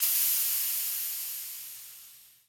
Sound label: gas; teardown; ps5 windows xbox series xs